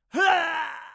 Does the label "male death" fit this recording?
Yes